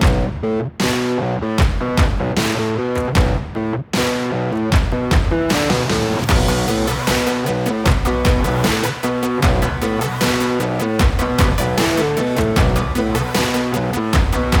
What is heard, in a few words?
Powerband BEAT short